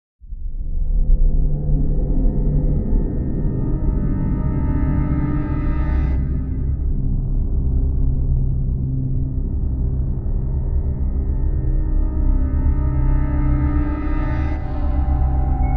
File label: Hunt - Ambient; warface unofficial soundtrack